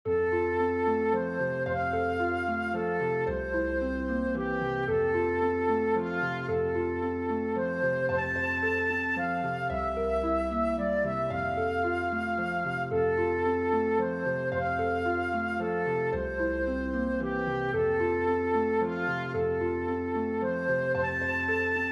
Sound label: for flute harp; burgma ller johann friedrich franz; lullaby